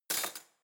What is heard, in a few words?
cutlery spoon drawer place hard